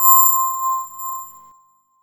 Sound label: amb c, Bell Atmos, Chillout; Best Service ProSamples vol.36